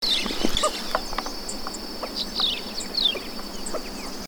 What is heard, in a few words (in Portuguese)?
canto do corucão